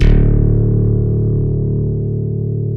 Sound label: stick, Chapman Stick; Analog Odyssey